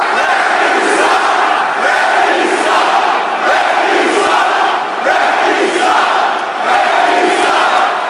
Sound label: ref you suck, football chants; nhl western conference